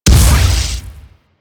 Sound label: Ship Dual Cannon Impact; Crp Moa Dual Cannon